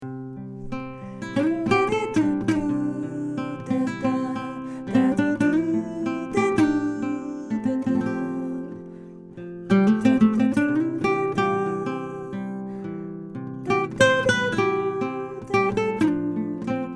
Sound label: solo